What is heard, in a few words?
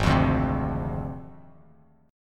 Ab 6th Flat 5th Ab, Bass Standard; strummed; JGuitar